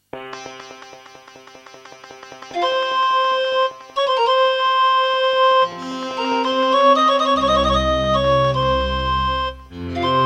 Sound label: NEC XR385 (DB60XG Clone) GSMODE; Prince of Persia 2 - Intro